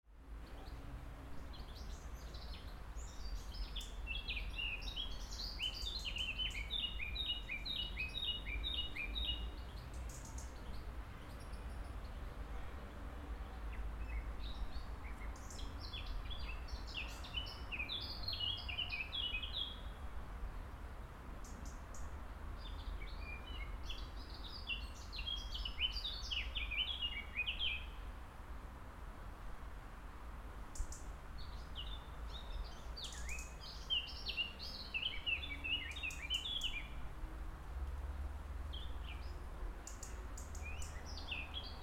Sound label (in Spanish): JARDIN BOTANICO GIJON PAJAROS; SantiagoAbadia